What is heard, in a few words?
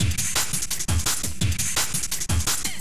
drowning, breakcore